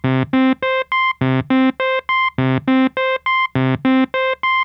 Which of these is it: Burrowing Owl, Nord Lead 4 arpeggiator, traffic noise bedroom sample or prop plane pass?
Nord Lead 4 arpeggiator